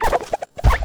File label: peace damage